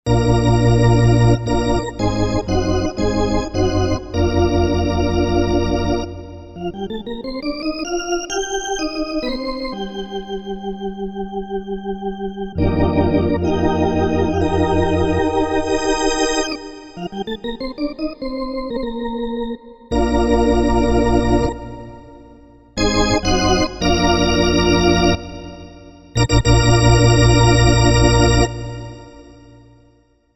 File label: Lowrey Celebration